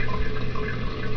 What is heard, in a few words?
pipe water run; valve; coil